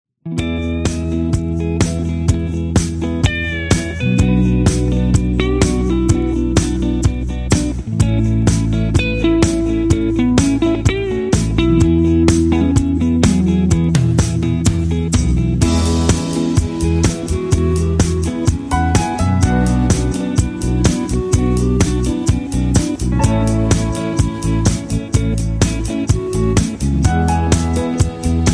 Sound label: Instrumental Leads Solo